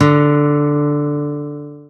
guitar

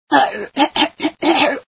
die, female

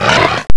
gonome pain

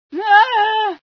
scream, female sci

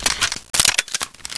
deagle reload

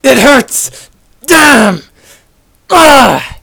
wound

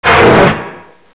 small flinch; tubequeen

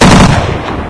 m16 burst

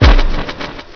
door move

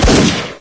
beretta fire, weapons

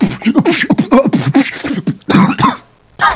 beatbox

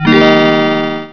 key

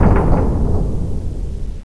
rack stop

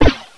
gun

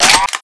sniper reload second seq; weapons; unreal2

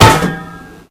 damage metal

